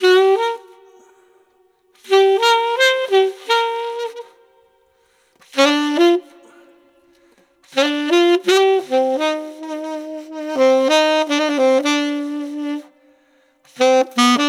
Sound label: solo; realtracks demos